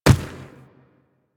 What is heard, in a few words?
Infested Medium Run Dirt, Footsteps; Warframe